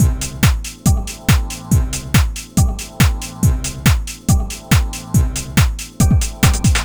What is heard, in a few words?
140 C trance kit, Drum & Perc Loops; Trance Explosion Vol1